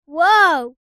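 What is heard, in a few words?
Sound of a boy's "wow"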